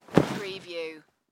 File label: Bean Bag Sit Down; household; general household; Sound Effects Bible